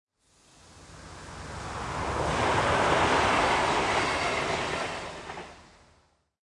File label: Ambience Train Passing; Subway Surfers City; Android, iOS